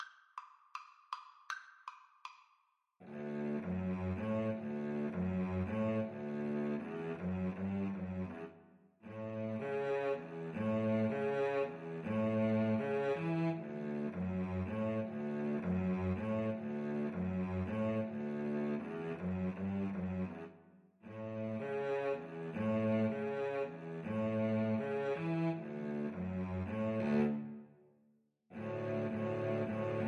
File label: Cello Duet